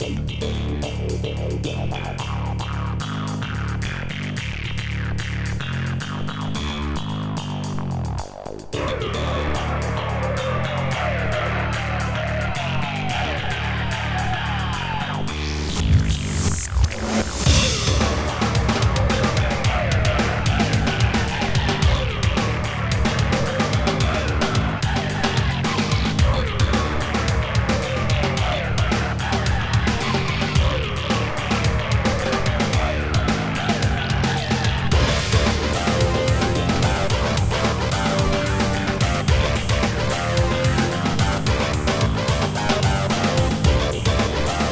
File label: Techno Combat; Borderzone